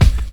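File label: bass drum hip hop; DRMKIT; E-Lab; X Static Goldmine 2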